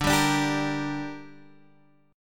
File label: D Suspended 4th Sharp 5th, Guitar, Open G